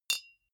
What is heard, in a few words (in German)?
deodorant hit soft, metall; soundpool